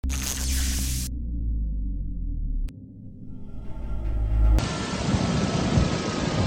Power off; The Henry Stickmin Collection

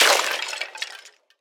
Skeleton Death

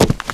Footstep Run Base; starbites

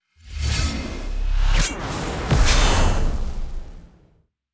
Energy Rush; starbites; Marie

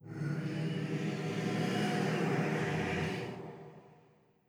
Cpatain Ghost

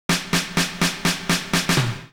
snare roll 4 beats

